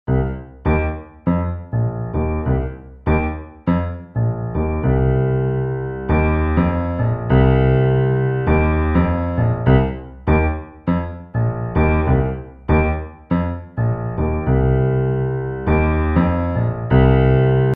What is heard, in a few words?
bassline practice